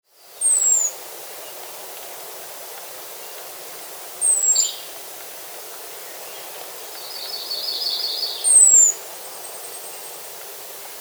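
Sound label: sounds of thrush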